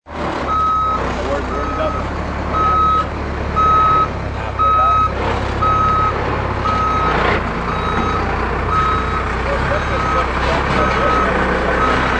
Beep beep beep and engine sound of dump truck backing up.; Trucks; Vehicles